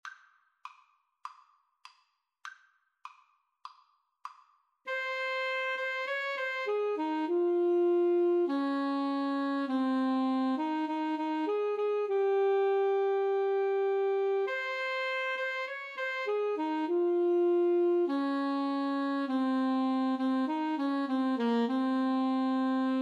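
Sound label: Alto Saxophone Duet, karaoke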